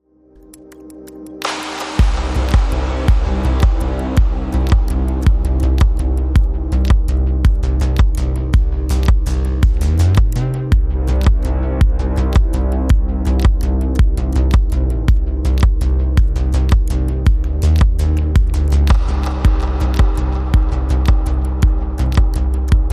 Bizet LArlesienne Remix Groove

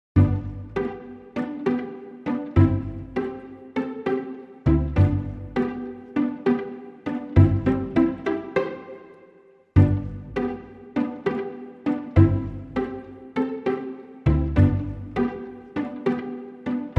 Reduced Strings Only

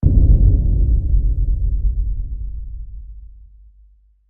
Hit Low Blow

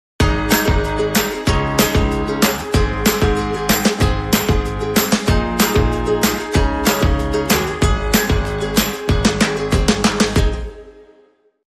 Acoustic Voyage, Sting Underscore

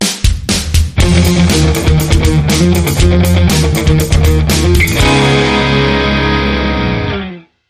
Power Chords

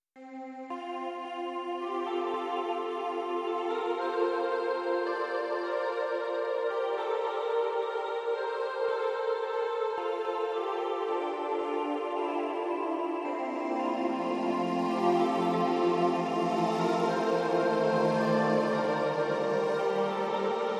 A Twinkly Away In A Manger, Ambient Mix

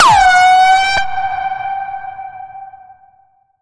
Bend, F

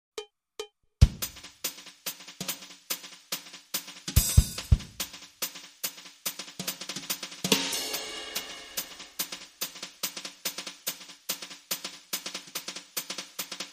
Jazz Brushes Loop Stereo Drums Only

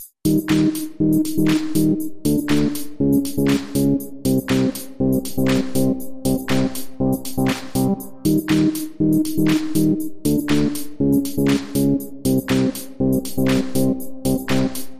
Inst No Beat

